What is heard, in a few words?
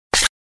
Flicking Sound; LG GS500 Cookie Plus